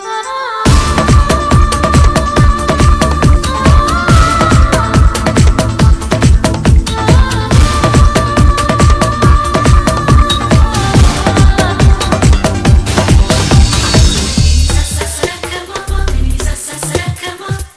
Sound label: Beat